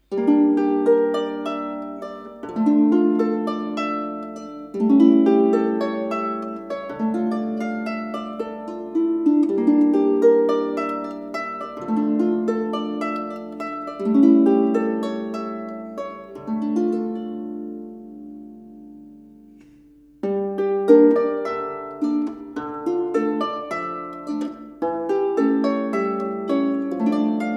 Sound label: two guitars